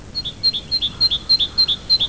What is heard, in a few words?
Parus major